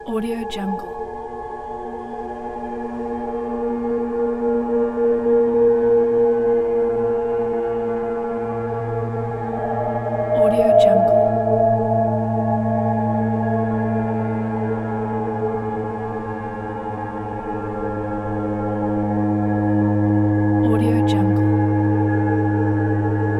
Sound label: cinematic horror eerie ambiance